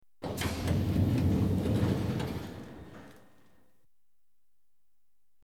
London Underground Trains; The BBC Sound Effects Library

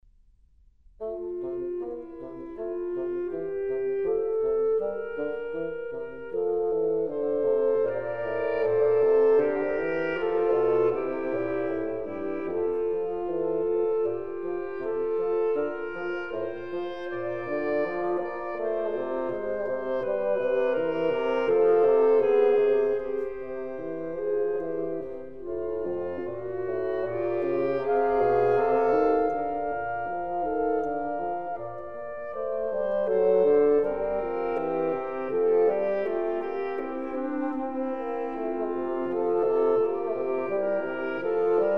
Adagio in F; Mozart; Serenades and Divertimenti for Wind

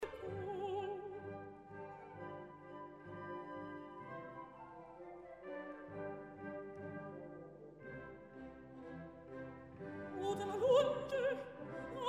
Marcia e Recitativo; Mozart; Idomeneo; Araiza, Hendricks; Odo da lunge

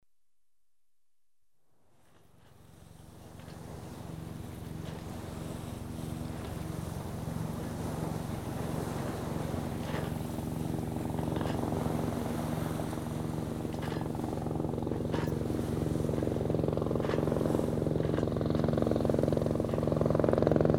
helicopter passes overhead; The BBC Sound Effects Library

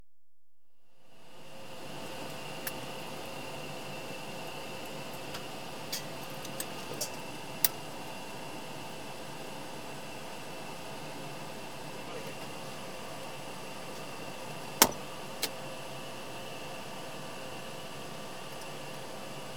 ENGINES START UP, TAXI, Aircraft; The BBC Sound Effects Library

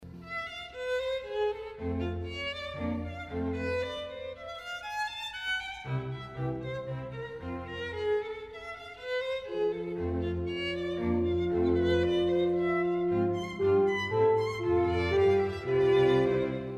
Divertimento in Bb Variation I; Mozart; Divertimenti; St Martin in the Field's Chamber Ensemble

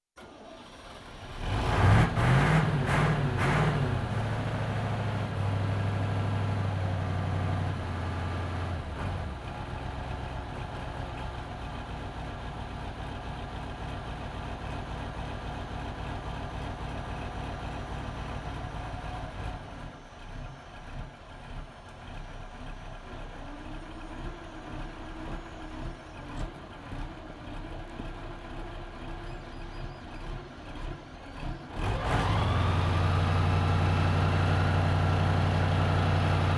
Fire Engine, Stoart, Engage Main; Emergency; BBC Sound Effects Library

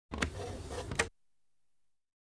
Diesel Trains Sliding window; The BBC Sound Effects Library

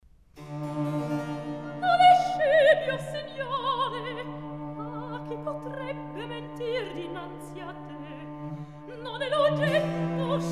Recitativo; Licenza; No e Scipio; Mozart; Il Sogno di Scipione

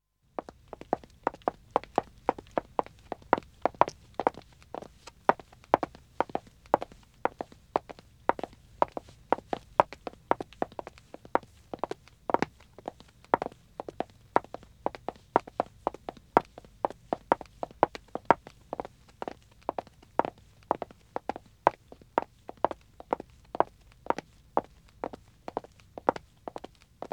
Wood (Dead Acc) Walking 2 wom, Footsteps; The BBC Sound Effects Library

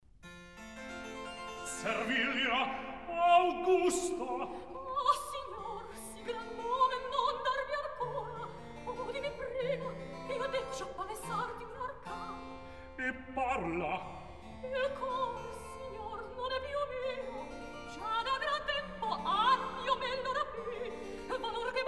Recitativo; Servilia Augusta; Mozart; La Clemenza di Tito

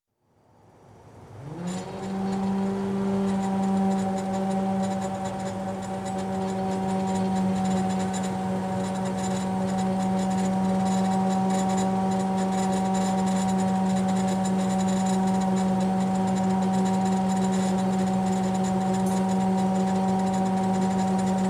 Building Site, Concrete Aerator; Construction; BBC Sound Effects Library

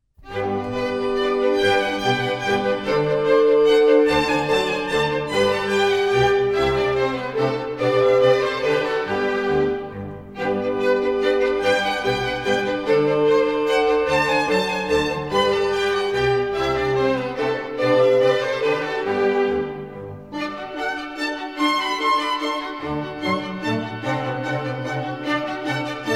Minuets, G major; Mozart; Dances and Marches

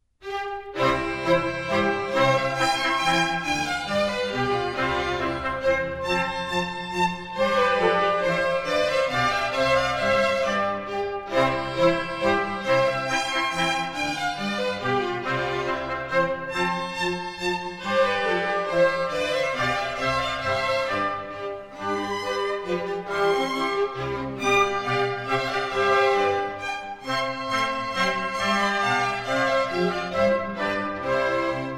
Minuets, C Major; Mozart; Dances and Marches

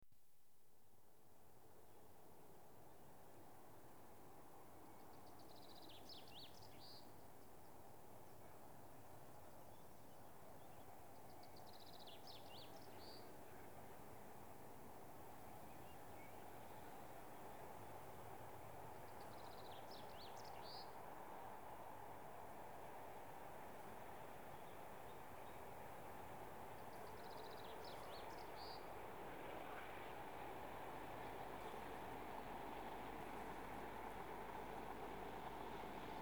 Trains Passing Diesel goods; The BBC Sound Effects Library

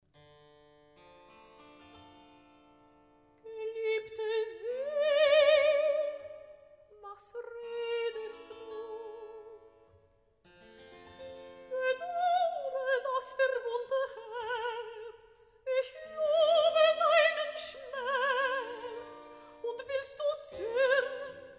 Recitativo; Mozart; Oratorios, Cantatas and Masonic Music; Grabmusik KV42-35a

